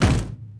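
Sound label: metal hit hollow medium; objects; Star Wars Obi-Wan; XBOX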